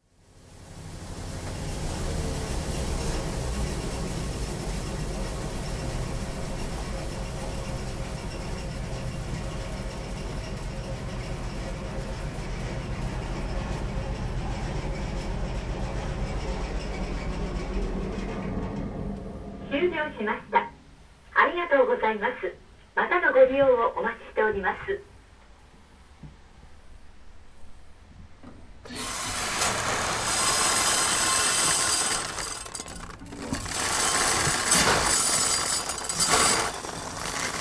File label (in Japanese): koin seimaiki; Chino Cultural Complex